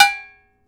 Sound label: cast iron clangs